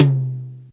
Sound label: high tom drum sound c key, Royality free tom sound tuned to the C note. Loudest frequency: 1109Hz